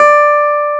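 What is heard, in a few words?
clavinet D5; AKAI S-Series CD-ROM Sound Library VOL-8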